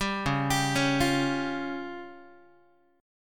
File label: Db Major Flat 5th, Guitar; arpeggiated; JGuitar